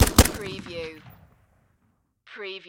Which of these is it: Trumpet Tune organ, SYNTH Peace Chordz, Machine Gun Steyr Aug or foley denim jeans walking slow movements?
Machine Gun Steyr Aug